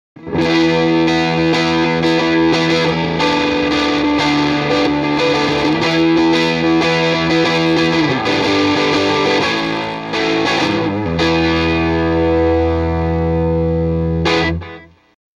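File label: m75 lp neck pickup dist jtm45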